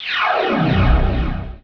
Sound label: teleport